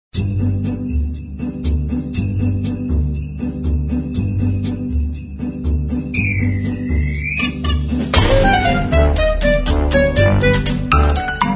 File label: Christmas Toy Factory, 一个有趣的勇敢的圣诞音乐乐谱与饰品和玩具特效。